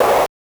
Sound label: snare; goodtakimu drumkit; fl studio; drumkits